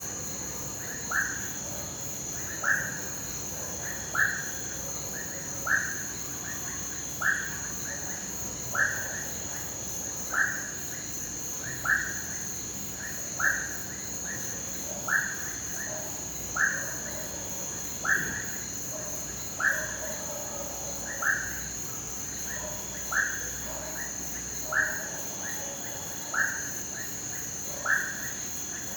Sapos perto depois longe ambiente rural a noite coro de grilos; gravacao em xy; animais; Coleção Sonora do Cerrado

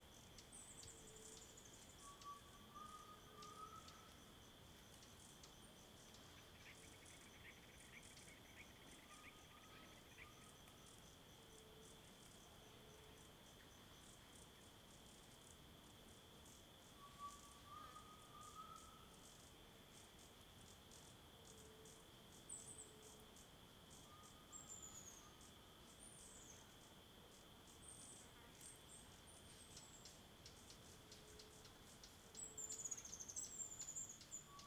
Ambiencia beira do rio calmo a tarde com muitos passaros

